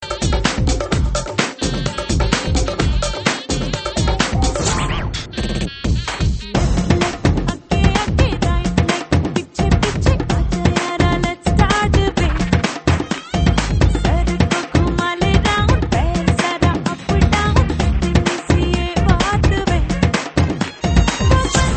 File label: Dhol